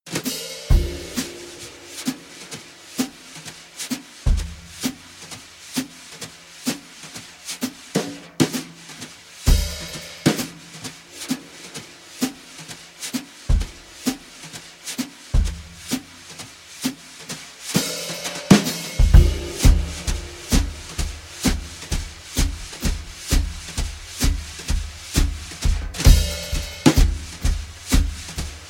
casual brushes

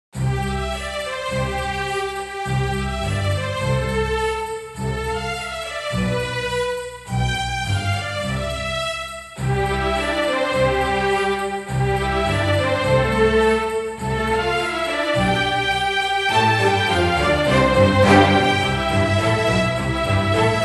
jingle bells

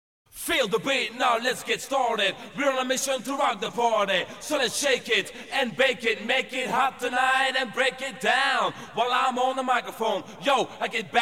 feel the beat acapella

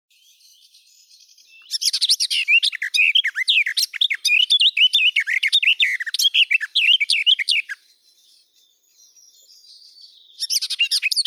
slavka sadovaya sylvia borin

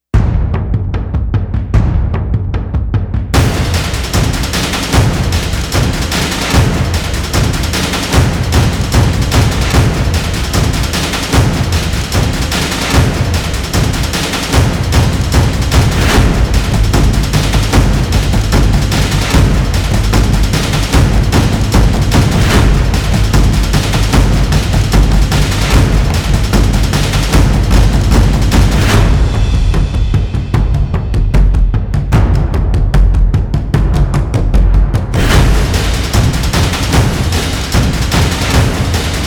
drums

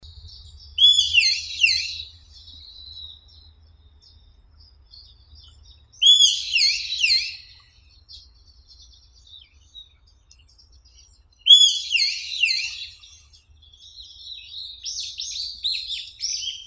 bulbul, Microscelis amaurotis; bulbul korotkopalii

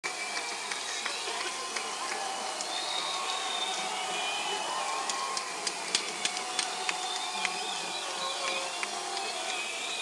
audience noise; encore break

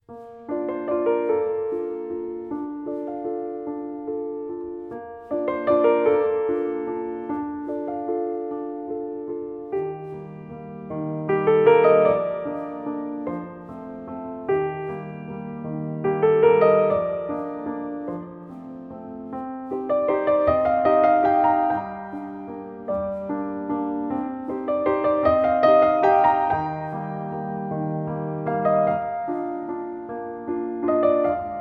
solo piano version

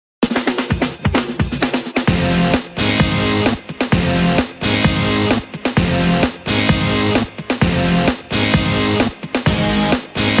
instrumental version

